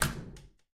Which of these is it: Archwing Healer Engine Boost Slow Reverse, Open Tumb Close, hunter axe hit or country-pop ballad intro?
Open Tumb Close